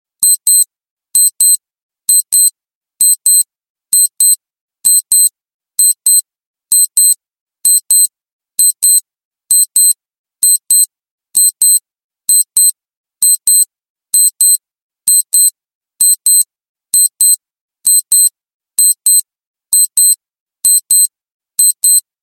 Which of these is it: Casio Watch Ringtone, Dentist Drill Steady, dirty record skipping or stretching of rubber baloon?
Casio Watch Ringtone